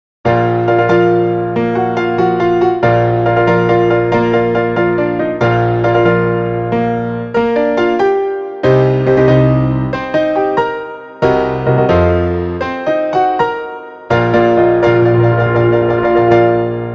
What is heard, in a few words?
Mi 10 5G Xiaomi Ringtone